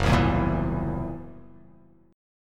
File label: F sharp 7th Flat 5th, F#7b5 chord; strummed; JGuitar